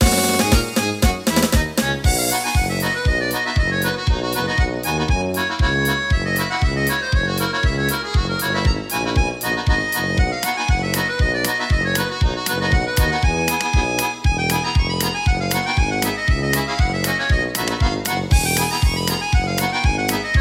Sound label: Fish Polka; Fantom XR; Rise of the Triad